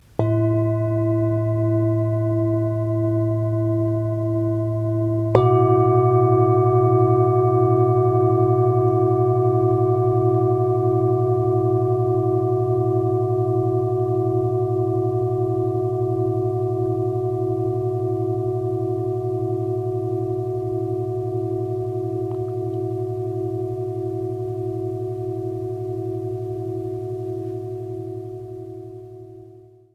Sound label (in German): Klangschalen